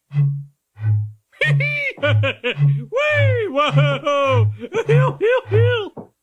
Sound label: hoedown